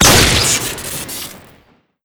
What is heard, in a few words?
sure shot shoot crit; tf2classic